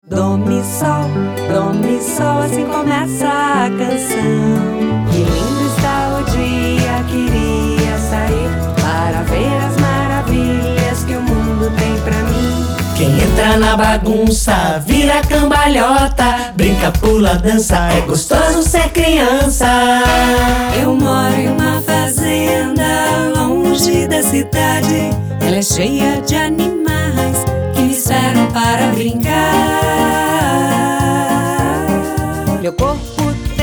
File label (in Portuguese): Português - Brasileiro, Canto Infantil (voz principal e coros)